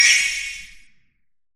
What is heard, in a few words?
power Shimmer